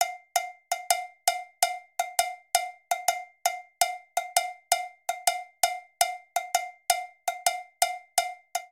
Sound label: Bembe Cowbell 110bpm; Latin Percussion; Ableton; Factory Packs